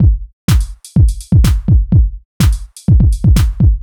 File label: DEEPHOUSE BEATS 125